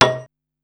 Thonk, Korg Wavestation-SR

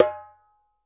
ASHIKO, African Perc; E-MU Producer Series Vol. 7 – Old World Instruments

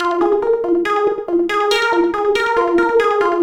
synth arp; Electrocution

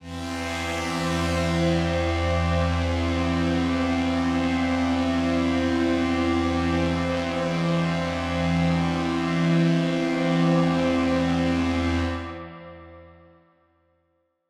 One Shot Drone E, dystopian drone; One-Shot Drones

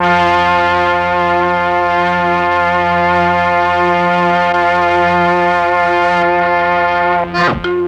MEL STR, Legendary M400; ILIO; Propeller Island

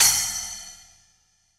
Splash Cym, Cymbals; Roland BK-5

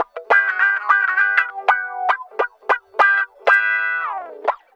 Guitar Loop; Guitars; Ambient Grooves

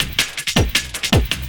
Loop, Drum & Bass, 160 BPM

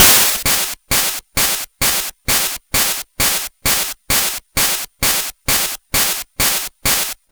Game Boy Noise Crash Loop

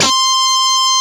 G5 guitar; AKAI S-Series CD-ROM Sound Library VOL-8; SET#1 GUITAR

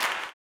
CLAP, Blues Soul; Spectrasonics Vocal Planet

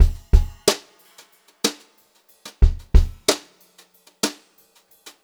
BEAT, Brushed Drum Loops

